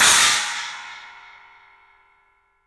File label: CYMBALS; 3D Sound; 3D Drums